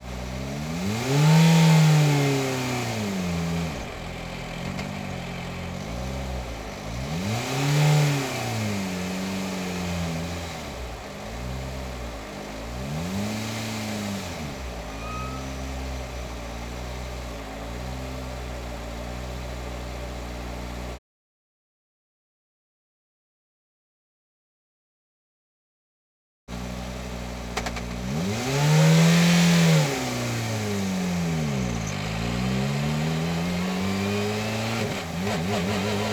Ford Fiesta WRC, Onbrd, Medium, Drive, Various, Engine, Center; 2016; DPA4061; Pole Position